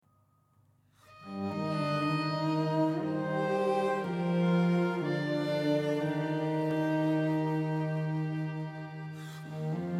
Exploration General Violin; horizon zero dawn

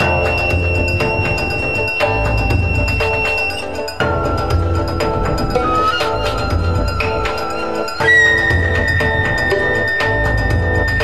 BGM; Adventure Player; psp

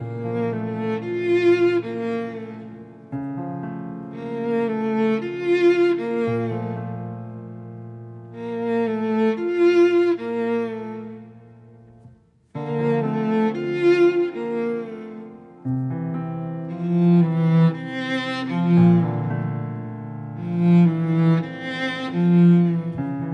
Music Level Erosion Layer

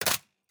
plastic break

